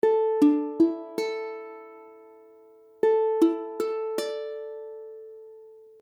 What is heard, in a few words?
Asus4, akordy ukulele